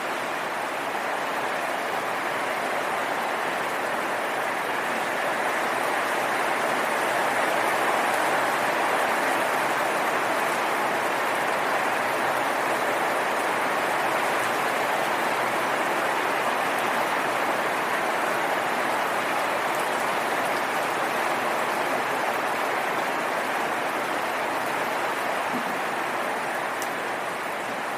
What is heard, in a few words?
Rain Sample